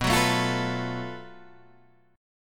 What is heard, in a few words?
F sharp Minor Major 7th Double Flat 5th, guitar